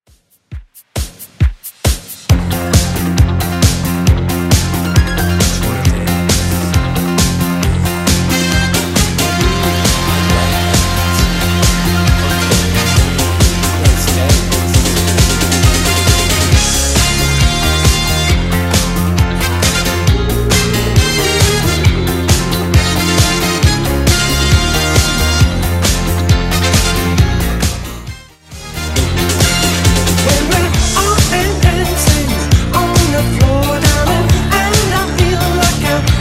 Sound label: 80s Redrum 135bpm; remixplanet